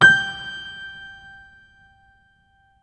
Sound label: Piano 1 (G; Sonic Foundry (Sony Creative Software); Ugly Remnants VOL-2